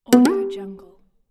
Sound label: jump; bitmaker